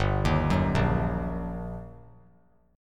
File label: G Minor Major 7th Sharp 5th, down; GmM7#5 arpeggiated; JGuitar